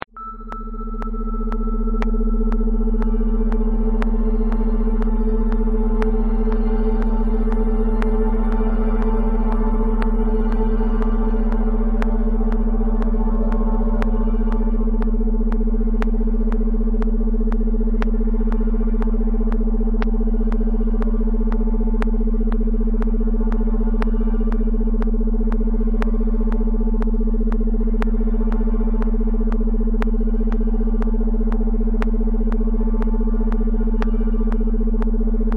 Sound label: crown long binaural